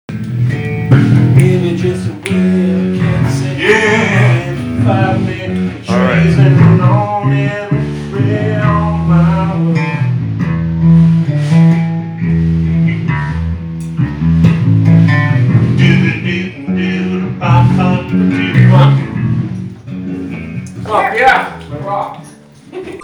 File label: bass